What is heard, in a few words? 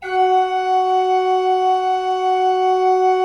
16-8 F4, Cathedral Organ; Propeller Island